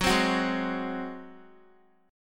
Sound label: Gb Suspended 2nd Flat 5th Gb, down, Guitar; strummed; 4E,4A,5D,5G,5C,6F